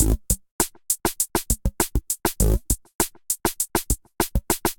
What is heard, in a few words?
D55 Groove, Drum Machines; Modewipe; Ableton